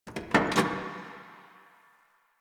Parking Lot Car Door; Warframe; Ambience; Nineteen Ninety Nine

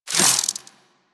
Spike Proj Whiz; Enemies; Warframe; Infested Ashen

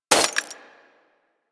Bullet Impact Debris Glass; Warframe; Weapons

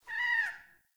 Trapped Beaver Call; Encounters; Animal Rescue

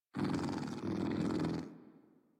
Kitten Growly Purr; Enemies; Warframe; Catbrow

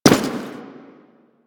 Step Default; Warframe; Quests; The New War; Veso

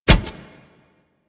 Step Metal, Footsteps; Warframe; Kubrow

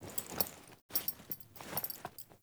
Foley Action Walk Cough; Characters; Player

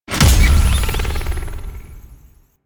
Hunter Crafting Build, UI; Warframe; Cetus

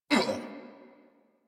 Pain; New War; Kahl

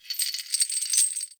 Under City Chain Creak

